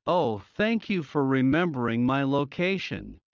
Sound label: Heart low; Classroom humanbody